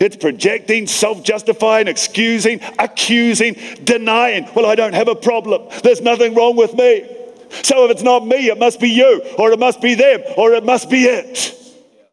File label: Vocal Phrases; Vengeance Samples; Vocals